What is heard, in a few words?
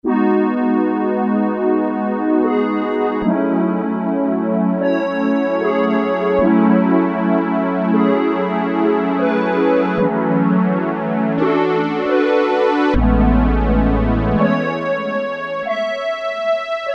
Pad, Roland MKS-70